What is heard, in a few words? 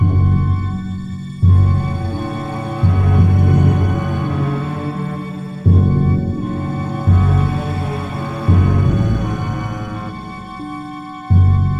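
Suspense loop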